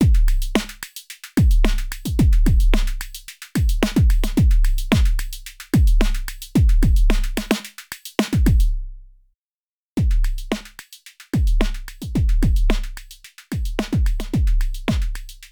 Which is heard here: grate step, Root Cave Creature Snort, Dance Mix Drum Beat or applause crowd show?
Dance Mix Drum Beat